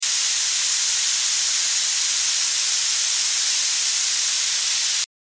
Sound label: fizzing